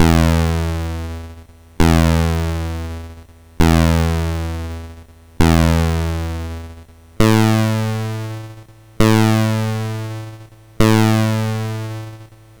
Guitar Tuner, MOS6581R2

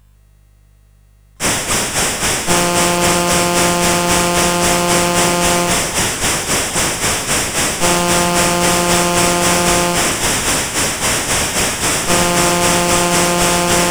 Train with Whistle, CSG8580; CSG8580R5

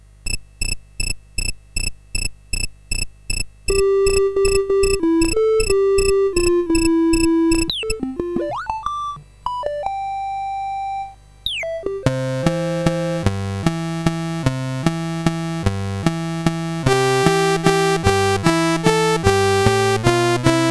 Whippoorwill, CSG8580R5

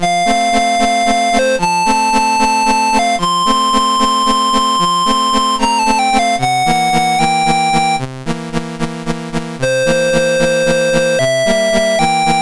Piano Concerto in C Major, MOS6581R4; K467

